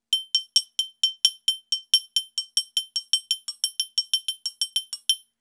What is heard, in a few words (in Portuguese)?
Frigideira base